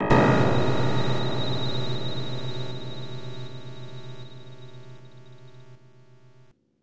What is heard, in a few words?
Shock; Dead of the Brain 2; PC-98